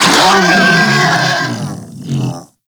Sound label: Kubrow Armored Vocal Death; Warframe